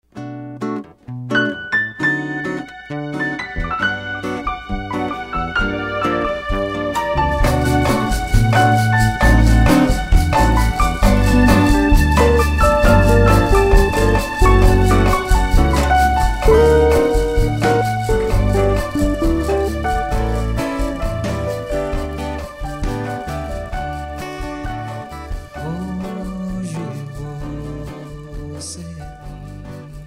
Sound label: BOSSA